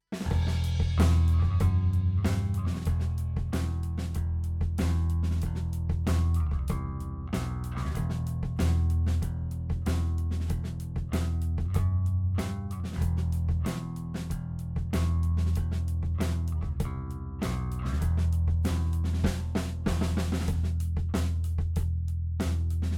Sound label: Drums and Bass